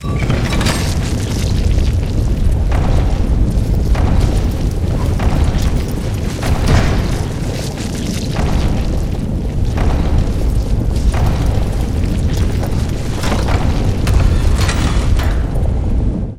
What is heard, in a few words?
Waterwheel; Urke; MUDevs S20.1-3